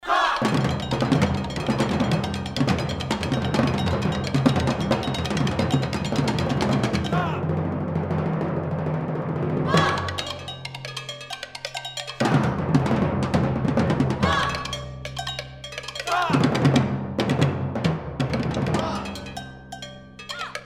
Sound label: Pieces for Percussion